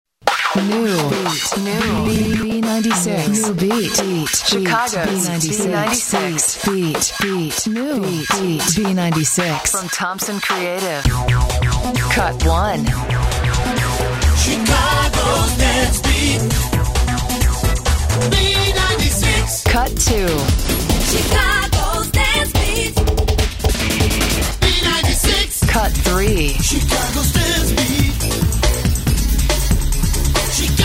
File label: Jingles; Thompson Creative; Radio jingles